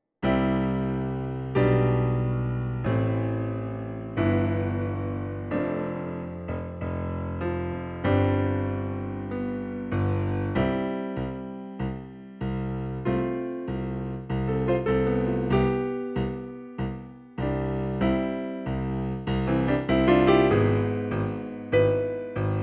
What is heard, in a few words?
tenor accompaniment